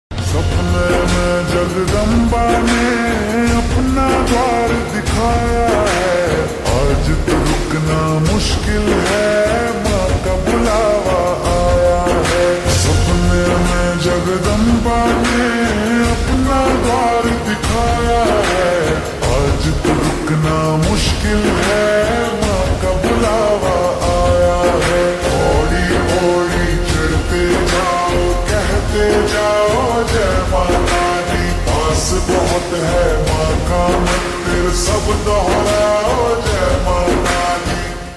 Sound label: Ringtone slowed reverb